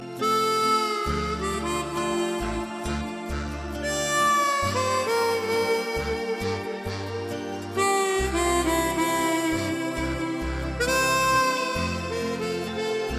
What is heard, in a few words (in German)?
Harmonika